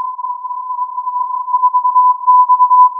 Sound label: filtered input